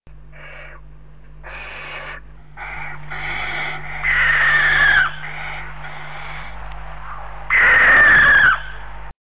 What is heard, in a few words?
Barn Owl